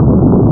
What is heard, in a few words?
thrust; Games Galore 3; Asteroids